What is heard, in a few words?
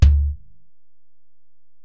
Ac BD, Alesis DM-PRO; 300 Drum Machines